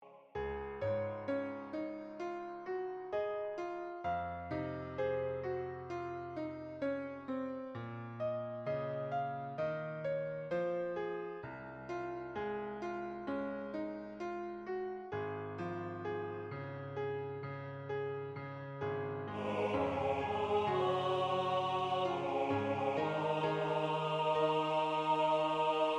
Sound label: MIDI; choir